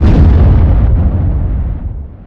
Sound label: explode